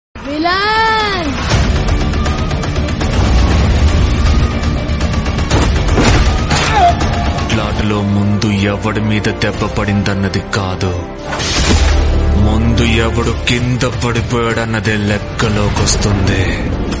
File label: telugu song; kgf 2